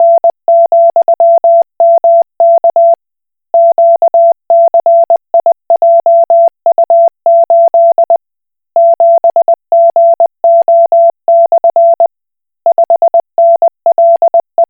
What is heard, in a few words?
Koch Morse